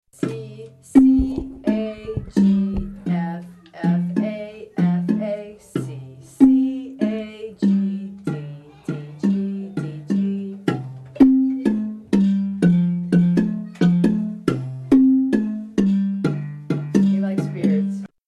baritone, marimba; Part 1; Baritone -- Jim; babamudiki

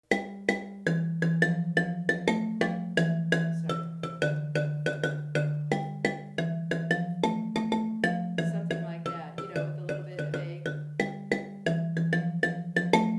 low line variation, marimba

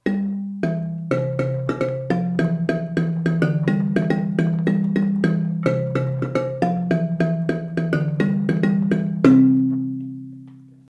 baritone ending, marimba; low line

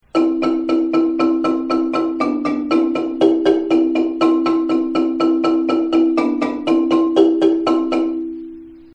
chorus, marimba

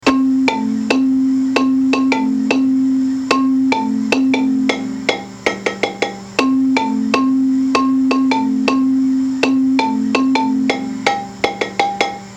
bari solo, marimba; manhanga

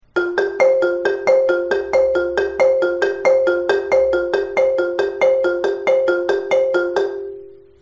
transition, marimba

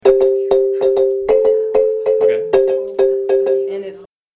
marimba

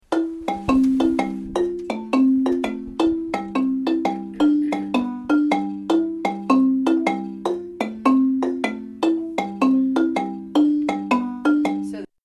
tenor, marimba; first tenor; part 1 (speed up); babamudiki

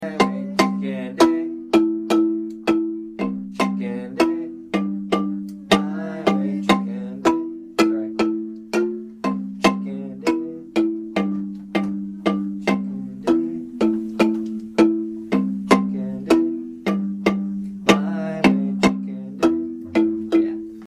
singing timing; chikende

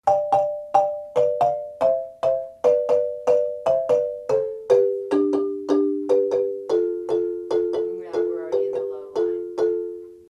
lead descending to low, marimba; kare mugomba

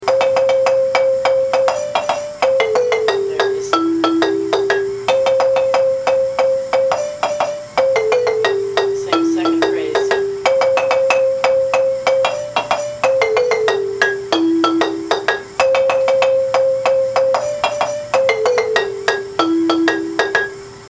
lead low, marimba; manhanga

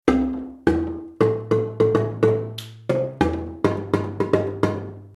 bass interlude, marimba

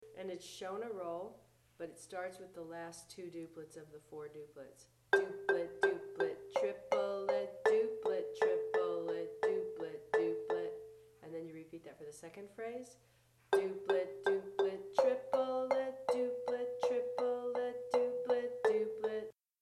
high rolling sticking, marimba; Shona roll

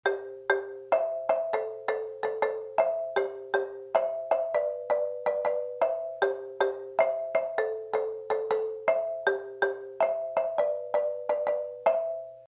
high chords, marimba

